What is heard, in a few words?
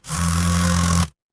office phone vibrate